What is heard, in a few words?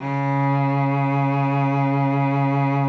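C#3, cello vibrato; Xsample VOL-7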